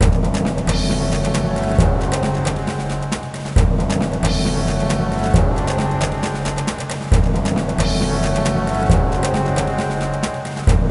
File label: Imperial Battle; Lego Battles 2009 NDS